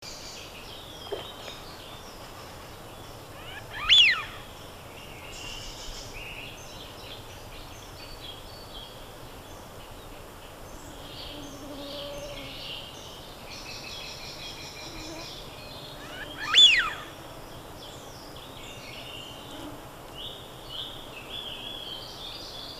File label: Anas penelope; aves; Голос